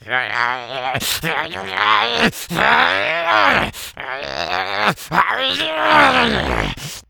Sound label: Level End; Angry Birds Star Wars 2; Emperor Angry